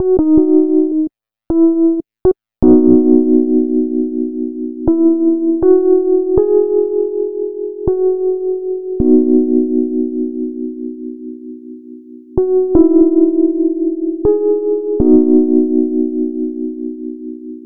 EP Main, Electro Lounge; Sonic Foundry (Sony Creative Software); 080 Pinky

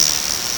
LSDj CR78, Nintendo Gameboy; 300 Drum Machines

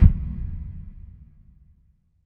SHORT, SHORTNOIS

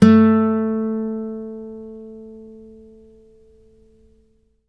ACC FG A, Acoustic Guitar; East West; Steve Stevens Guitar Samples Collection

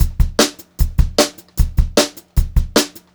Brushed Drum Loops

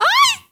FEMALE RAVE; FEM.NOISE; Spectrasonics; Heart of Africa

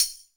tamb, house; 90 sSampleCDs; Crypton - DrumBase5000

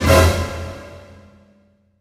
HIT, orch hits; Orchestral Loops

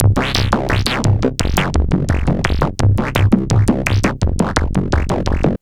SynthBass, Synth Bass Loops; Sonic Foundry (Sony Creative Software); Groove Elements

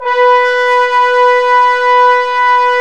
BRS BONES, LOW BRASS; Roland - LA Composer vol. 1

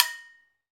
Fijidera; Club-50 - Foundations Roland; Agogos

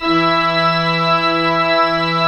MANUAL E3, Pipe Organ; E-MU Producer Series Vol. 2 – More Studio Essentials; Keyboards

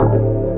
Repeater, Funk Keys; Sonic Foundry (Sony Creative Software); Synchro Funk

